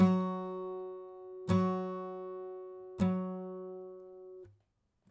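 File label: acoustic pattern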